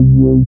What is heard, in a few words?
SYN 202, MC-202; Sound & Vision - Gigapack I CD 2